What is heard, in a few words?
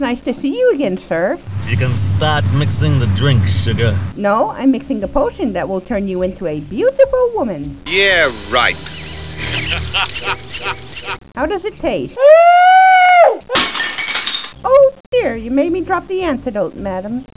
potion